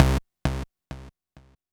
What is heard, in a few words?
C2, SynthLine; 90 s Sample CDs; NiceBeats; SynthLine VOL-1